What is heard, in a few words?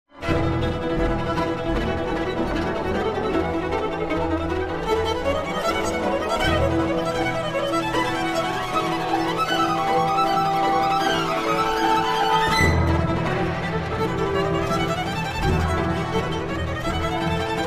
violin concerto, excerpt